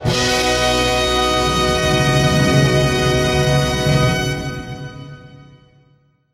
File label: Brass and Drum Tag in Eb